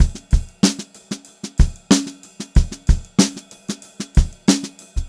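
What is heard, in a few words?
STRAIGHT VARIATION, Funk-Groove Drumloops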